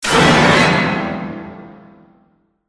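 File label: Sting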